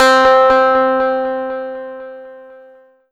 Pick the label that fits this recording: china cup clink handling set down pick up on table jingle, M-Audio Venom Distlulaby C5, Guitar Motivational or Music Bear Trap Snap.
M-Audio Venom Distlulaby C5